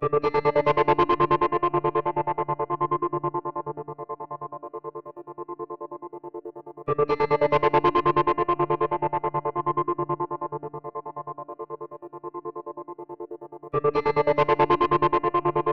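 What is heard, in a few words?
140 d guitar loop; methane and octane; hollywood audio design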